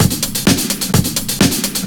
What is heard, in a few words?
Straight Loop 128 BPM, House